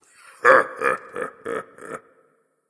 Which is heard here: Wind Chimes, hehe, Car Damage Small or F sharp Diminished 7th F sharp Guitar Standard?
hehe